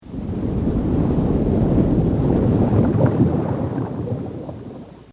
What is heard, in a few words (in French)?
sous-marin